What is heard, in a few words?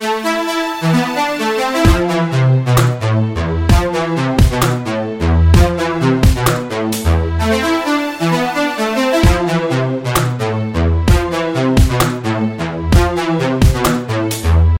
Synthetic Strings